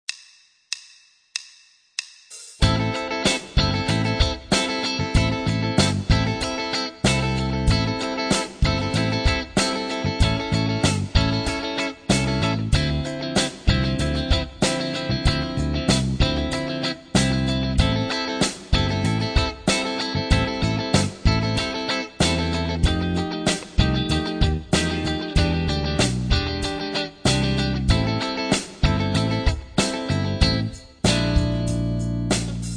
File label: Playback, Rock & Blues Solos; De Haske